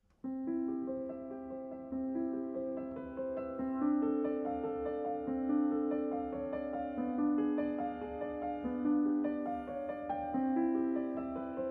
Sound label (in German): Praeludium C